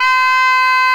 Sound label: C 4, richter; SoundBytes - Early Patches